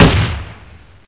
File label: hmg, weapons; hl2